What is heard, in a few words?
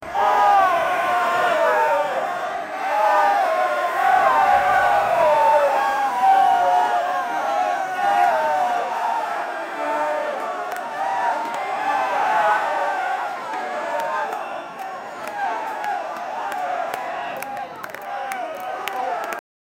crowd chanting the word, enthusiastic